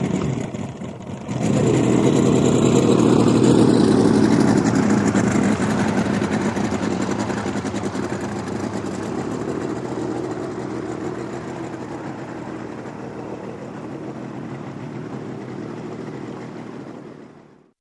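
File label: 150 Hp Mercury Away Drive, 150 hp Mercury Boat Drive Loop High; 150 hp Mercury Boat Away Drive Away From Dock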